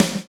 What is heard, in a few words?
snare life; Roland L-CDX-01; Snares 5; Sn Modules 5